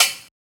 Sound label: hat knock, Open Hat; Hip-Hop; Drum One Shots; SD CAICOS Hip-Hop Sample Pack; Slate